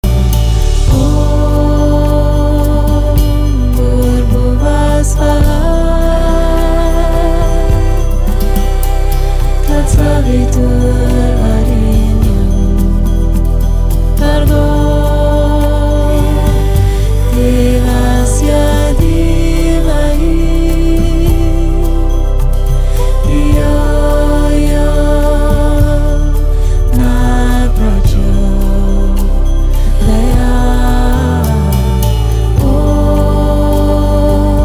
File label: gayatri